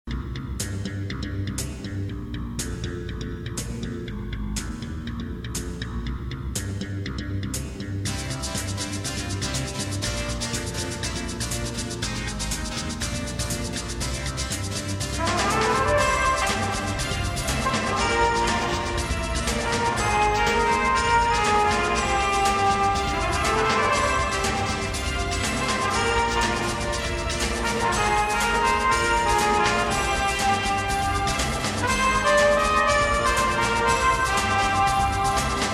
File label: Gingle